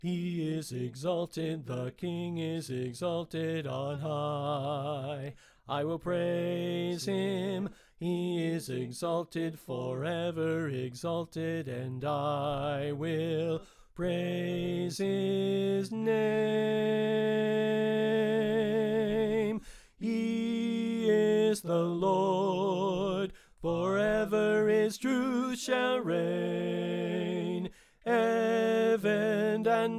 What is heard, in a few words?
Soprano Left